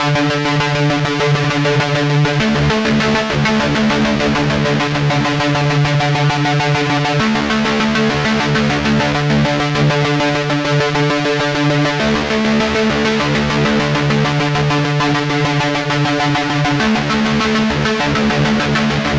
D arp loop

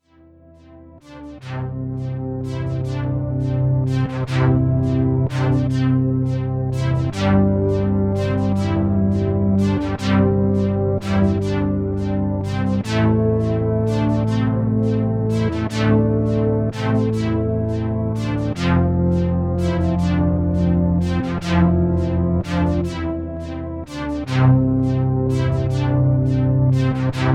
cinema strings

